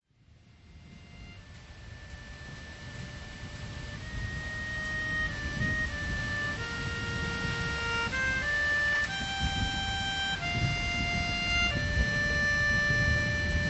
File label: Melody